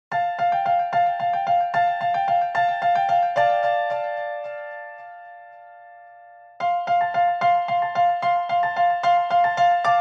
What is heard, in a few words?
Keys Ringtone; Motorola